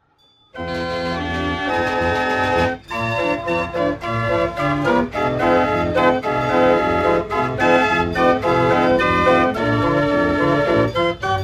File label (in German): Weihnachten steht vor der Tur Konzertorgel; amiga